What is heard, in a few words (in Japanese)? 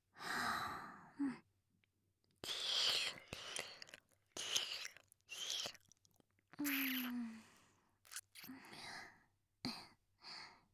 耳舐め, ASMR; SEあり